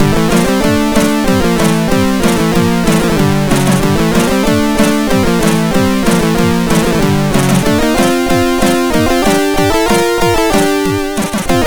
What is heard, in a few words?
Cover, sid; MOS6581R4